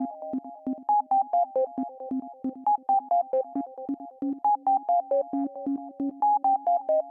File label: 135 House Lead Line Fmin; Dance; DJ; Synth; Pro Tools Essential Loops; Digidesign